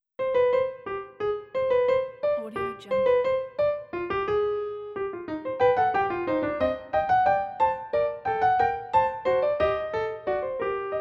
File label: bach fuga c moll